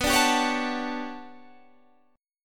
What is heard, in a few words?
G Major Sharp 11th B, chord